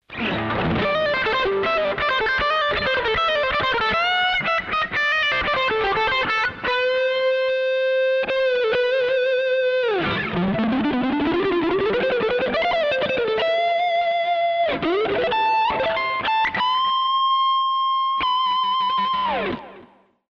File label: Hot rod, fender